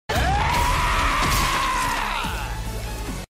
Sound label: screech dubbed version; kars